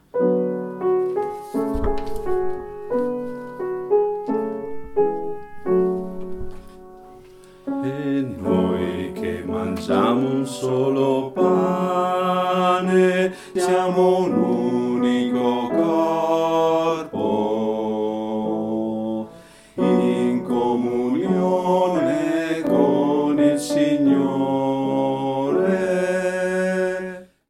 Eucarestia, bassi

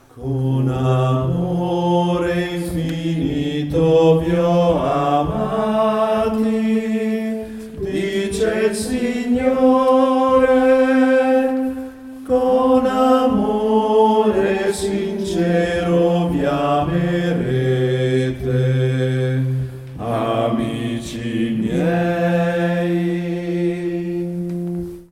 Con Amore Infinito, uomini

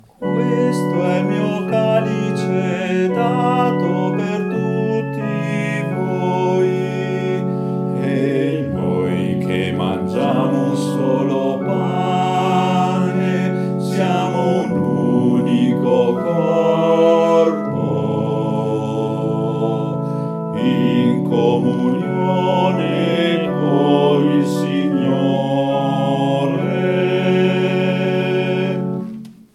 E Noi Che Mangiamo BASSI; Eucaristia; pasqua 2023

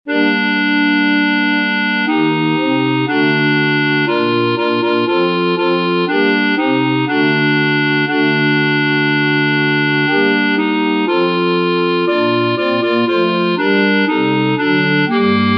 CONTRALTI; Veglia di preghiera - Santa Cecilia 2022

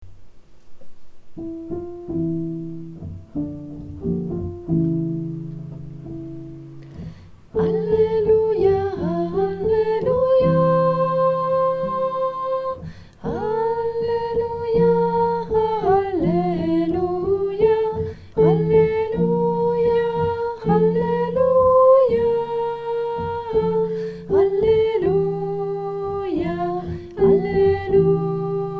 Alleluia Lode Cosmica TENORI

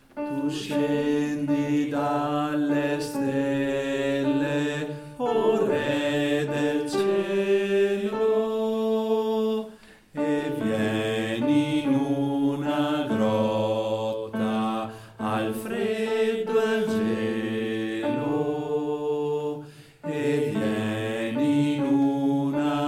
Tu Scendi Dalle Stelle BASSI

